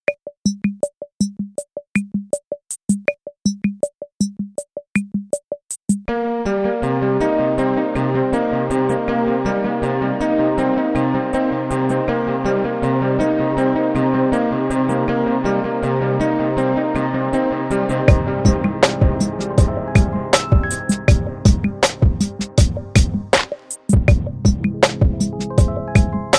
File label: Beats